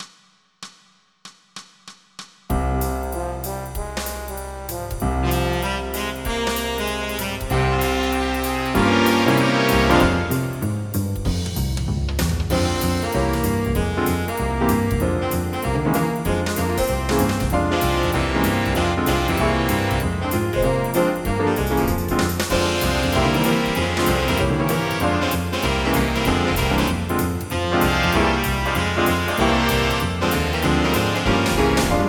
music

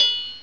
A4 Open Triangle; SoundMusicSys; Simple MIDI Player

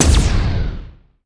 lsr twin; StarSiege

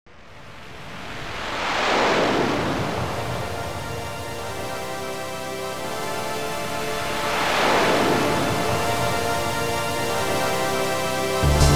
WAVES

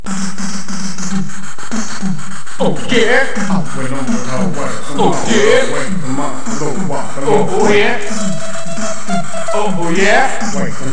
RAP; 7invasores

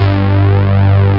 LW STRING; Groovy Bytes: Behind the Moon

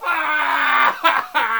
waaah; Tempra; comdex

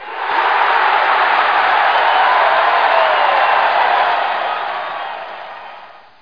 CHEER; Duke Nukem 3D Kill-A-Ton Collection

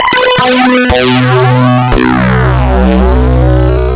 flang guitar

